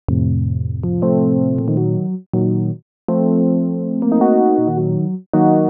synth

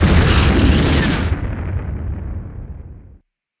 BLAST; ImpGal2; groundwar

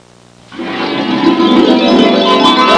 harp; Sound Library 2000; Wayzata Technology

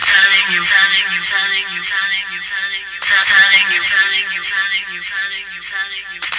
Perc; GrooveMaker demo; Serious Software; Macworld 1999-04

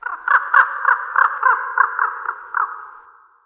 birds crow

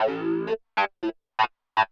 synth house 125 A; eJay; Keys

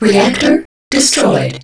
dest reactor; Starsiege

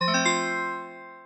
combine; anito; bonus

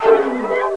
MOAN; Total Chaos Nukem 3d Pack; duke

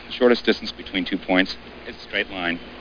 crushed

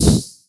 fire; GoldMinerJoe

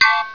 HIT

